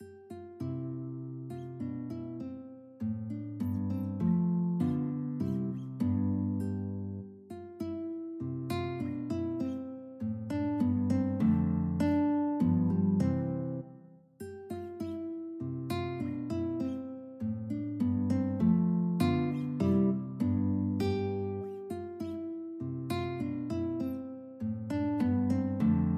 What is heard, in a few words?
guitar